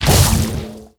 beast lava ball splash

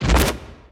bighorn hide

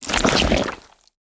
death reverse

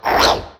imp growl

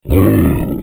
beast death

